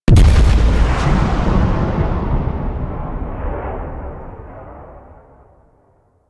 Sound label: Obelisk Orbital Strike Launch; Warframe